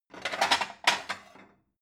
plate multiple take

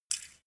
bowstring shot

